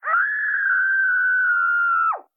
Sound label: Horror Movie Yelling